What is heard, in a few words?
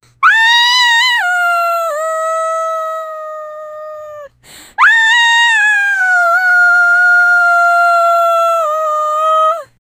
young scared woman crying, distress